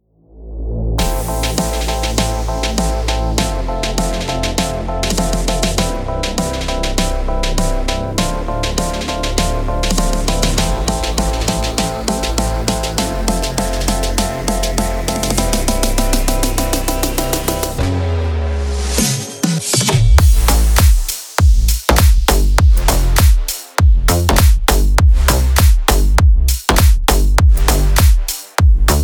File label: Instrumental